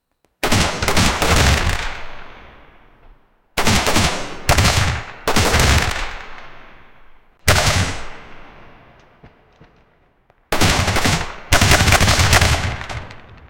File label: a pistol fight with ricoc